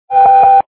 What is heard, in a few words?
lose beep